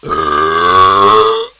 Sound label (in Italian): Ascendente, Rutti